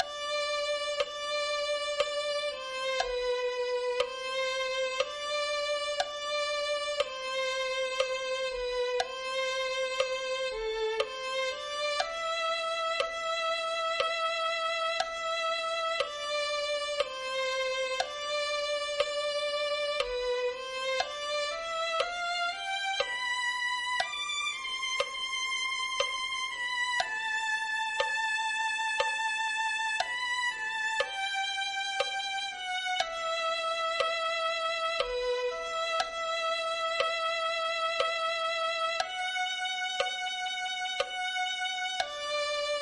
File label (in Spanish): violin